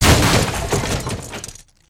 racing wood smash; destructibles

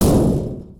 Finish Line Bounce

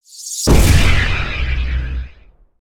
Flashbang; Flashbang With Lead; aronwk collection; ndaudio

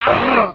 Thwok grunt; Summoner

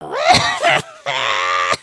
collapse; emotes

